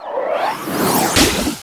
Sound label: Ice Arrow; Fly For Insanity